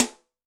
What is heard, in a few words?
Rock Rim, Yamaha DTXpress-II snare